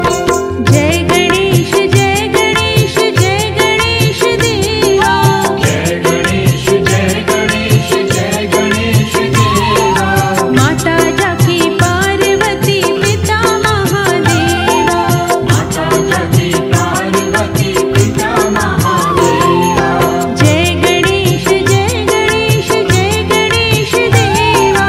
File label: Shri Ganesh Aarti Evam Chalisa